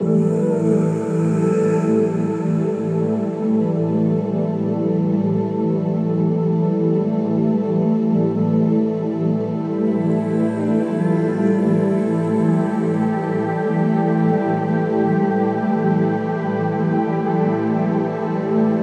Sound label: Ethereal Textures, downtempo chill; Black Octopus Sound; CallumCantSleep